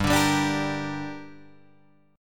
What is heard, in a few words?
G Minor G down Guitar Open G, chord